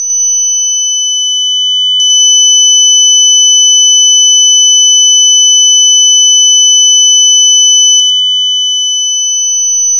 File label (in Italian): suono acufene riverbero